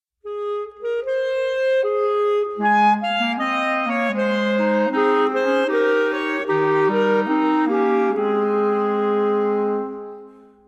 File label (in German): Klarinettentrio; O goettliche Liebe